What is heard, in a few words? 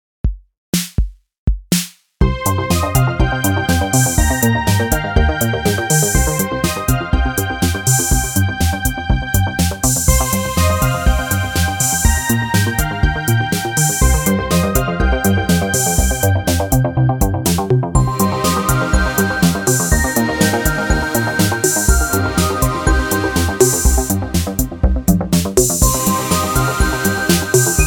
Electronic ambient background beat